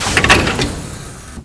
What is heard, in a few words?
door base; environment; starship trooper